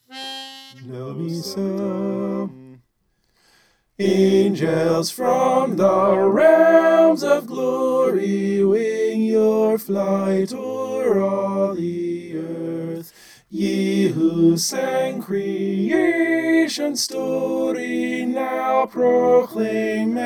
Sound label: soprano